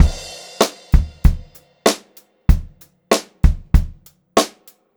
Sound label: Pop-Rock Drumloops; 96 POP 3